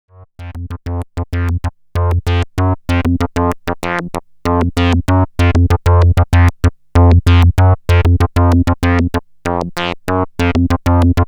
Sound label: Seq C